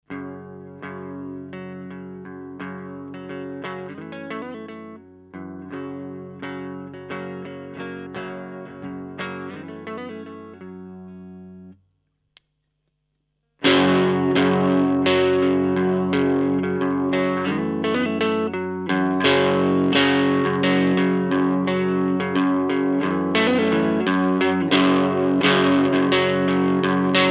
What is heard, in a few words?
EQD Plumes Mode 3